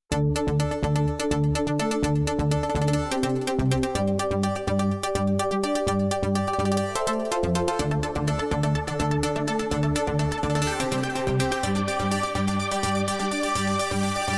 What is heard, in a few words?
DJ Montage